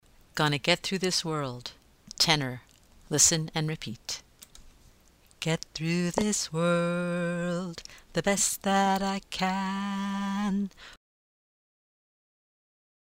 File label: Gonna Get tenor drill, chorus; Listen and repeat